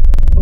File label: Keys EP; Synthetic's Serum Collection; ESW X Keys